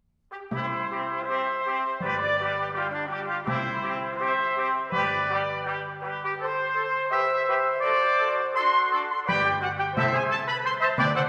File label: Divertimento in C major, for 5 trumpets & 4 timpani - Allegro; Mozart; Serenades-Divertimenti-Dances